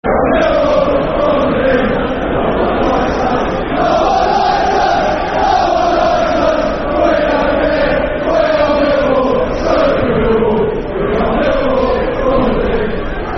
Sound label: Football Chant (Soccer Songs); We Are Millwall; Millwall Mfc